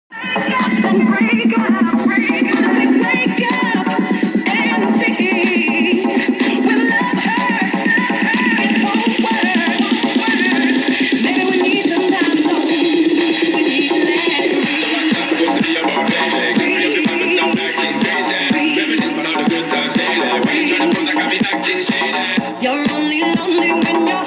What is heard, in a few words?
breath